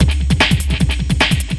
Beat, Futurist Drum & Bass; Drums & Percussion

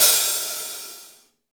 V-Pop Open Hi Hat, Roland BK-5

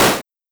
Simmons Clap; Drums

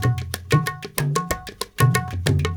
India, Heart of Asia

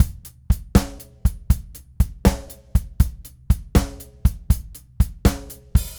Rocky Mountain Groove, Drums

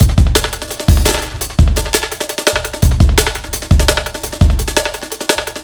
Live Old Man Delay, Drum n Bass Beats; KJ Sawka; Drum n Bass & Beyond

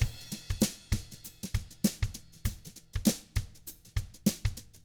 FUNKY, Drums

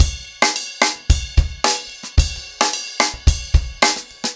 Crash 110BPM, ACOUSTIC DRUMS

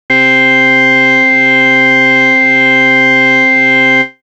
Synth; Coldcut's Kleptomania VOL1

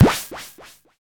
FX DRM, Analog; Sound & Vision - Gigapack II

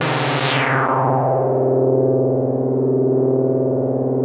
LEAD C2, ODYSSEY, Vintage Keyboards; Big Fish Audio; First Call

